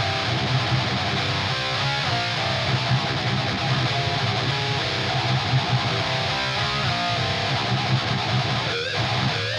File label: Drums and Jingles Evil Guitar D